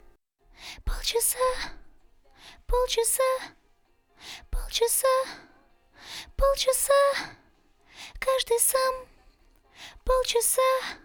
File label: Backing Chorus